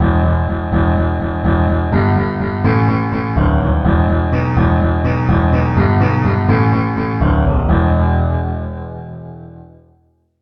dulcimer bass

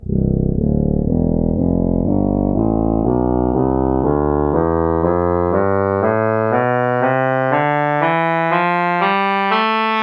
soprano sax tonleiter

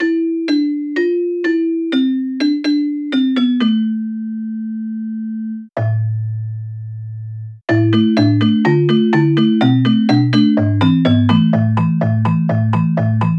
celesta; SpectMorph Evaluation #4

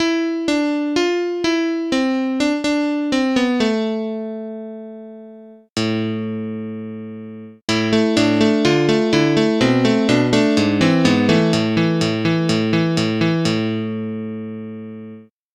electric piano; SpectMorph Evaluation #4

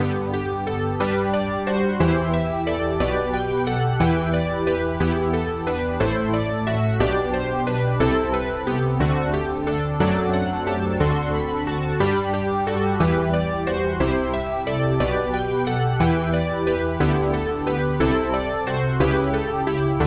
synth brass

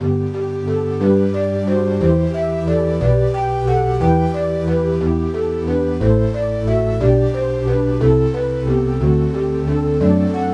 shakuhachi

